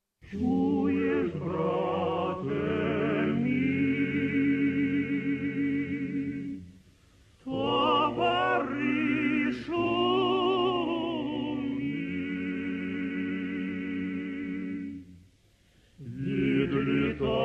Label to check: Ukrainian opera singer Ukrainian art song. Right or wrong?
Right